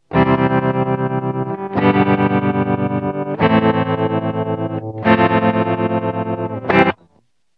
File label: trem